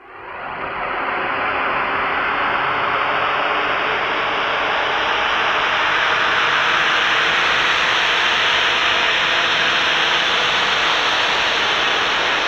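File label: ambient, alien ambi; Best Service ProSamples vol.36; Chillout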